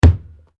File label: Sport Ball Rubber Bounce Wood Interior, Rubber ball